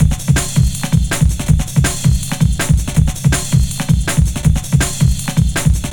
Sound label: Loops, breaks; M8